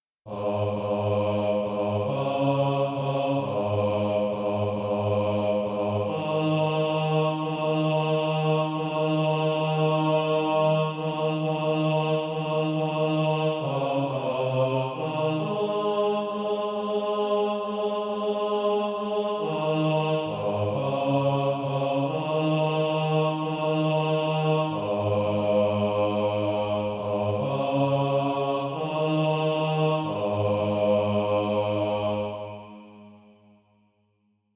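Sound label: bass, Practise Music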